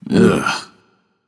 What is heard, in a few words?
ugh; smite; rama